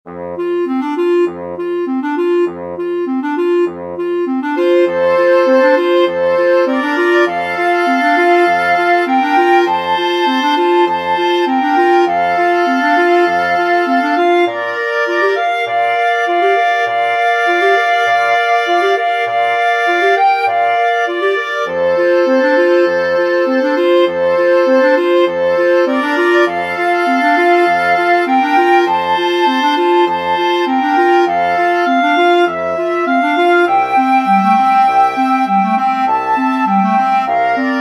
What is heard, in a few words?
wind quartet; worried man